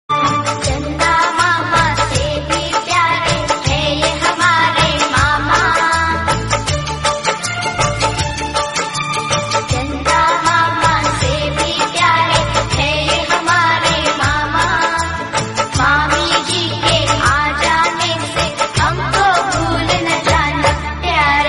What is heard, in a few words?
romantic hindi